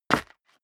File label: step leather shoes concrete grit single stair up; schritte